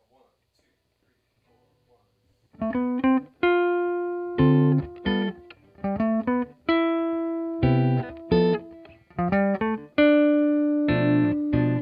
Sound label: deluxe jazz